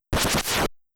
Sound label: SMAAAASH; mother1